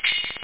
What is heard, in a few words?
tambor, cymbals; da capo vol1; drums